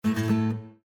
Windows Hardware Fail; abbottabad compound

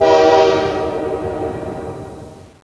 train horn far

